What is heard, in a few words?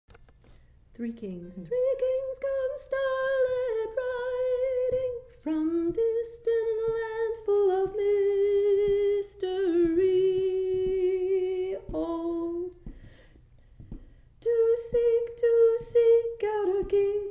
Three Kings Come Star Led female